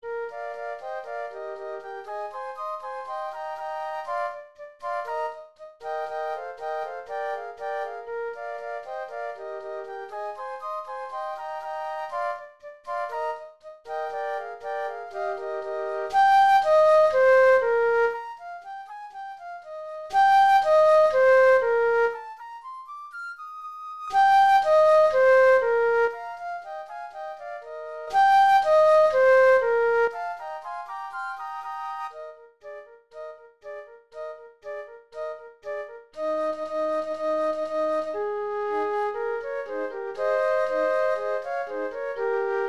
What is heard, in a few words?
flute trio; orpheus in the underworld